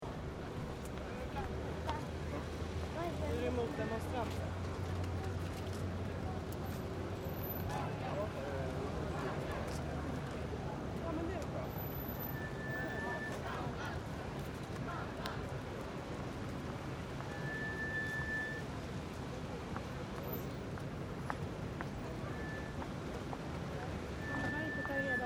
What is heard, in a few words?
City Demonstration, Anti-racist demonstration in Swedish city. Group of people shouting and chanting; light traffic; City Sound Effects; LynneMusic